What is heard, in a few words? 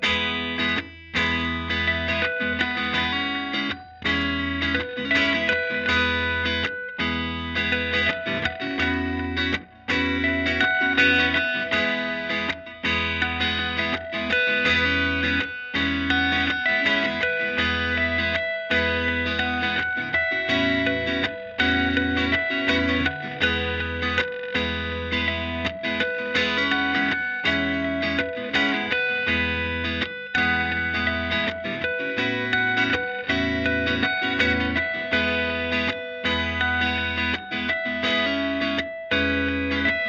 Harmonics